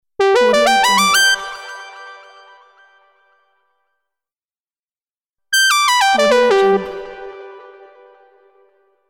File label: message sound; soundfoodsfx